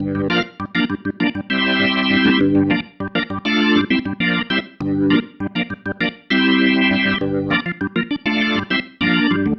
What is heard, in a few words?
Pattern, Organ